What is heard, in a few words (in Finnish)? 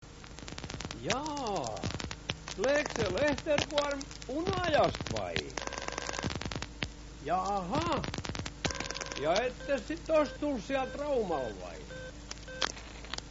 telefooniaani